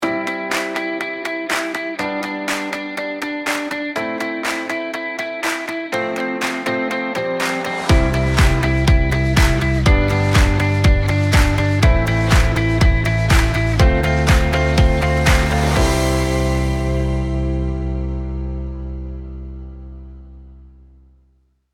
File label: music